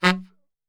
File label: F#1 SAX SHOT